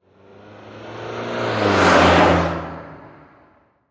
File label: Honda Civic Drive By Fast; Transportation Automobile; Car Sound Effects; Blastwave